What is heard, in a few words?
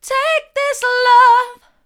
take this love mid, vocal